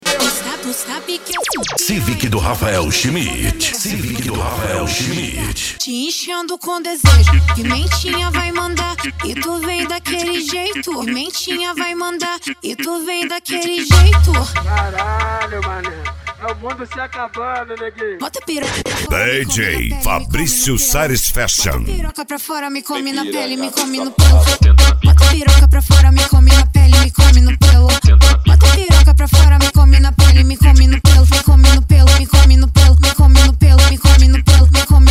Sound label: funk mega bass